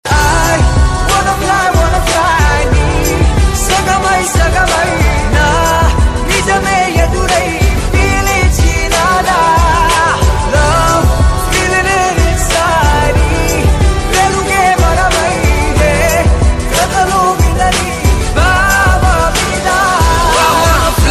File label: English Ringtone